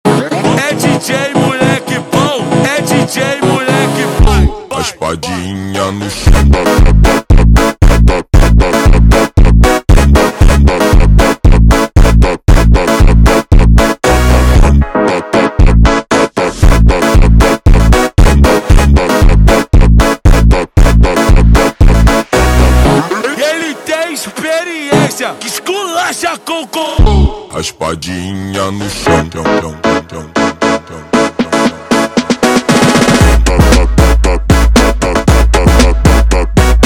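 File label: slowed, phonk music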